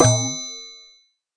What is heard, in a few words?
Processed Ethn Perc, ETHNIC and WORLD PERCUSSION